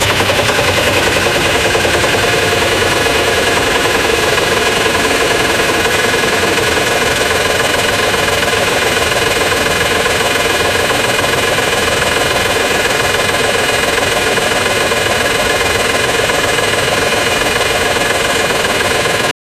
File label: an idling class 66 diesel, locomotive